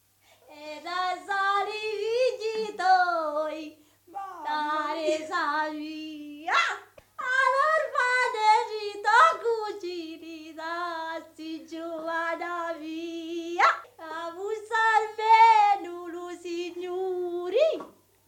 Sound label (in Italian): lamento funebre; Cardeto; Mammola; Cinquefrondi